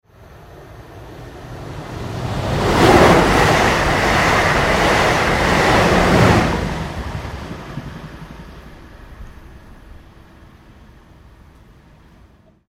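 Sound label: Train Electric Up and Pass - Fast pass close; Train Sound Effects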